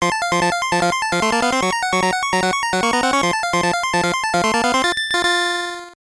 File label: Cutscene Jingle; pac man gameboy